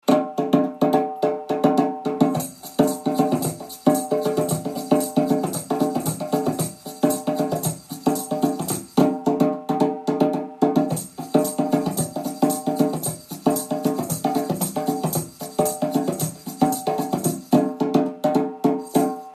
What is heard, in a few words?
Jingle